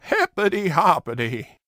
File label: hiphop